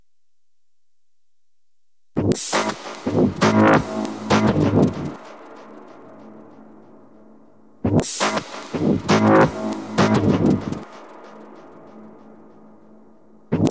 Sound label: oscillariffz